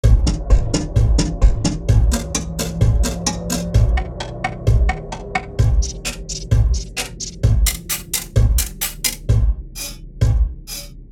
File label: Perc FX Perc main